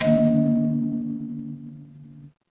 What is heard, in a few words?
instrument kalimba